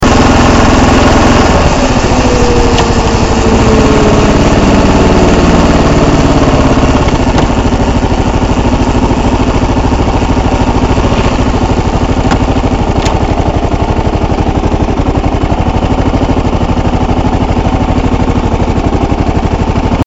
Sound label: noise example, klr650; what is this noise